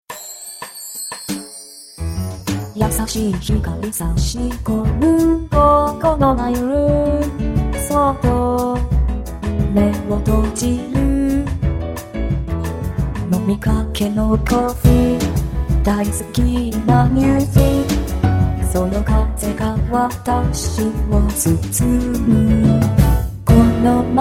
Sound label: Vocaloid Demo Song